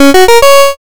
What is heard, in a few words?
start